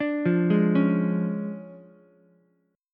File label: D Minor Major 7th Flat 5th D Ukulele; DmM7b5 arpeggiated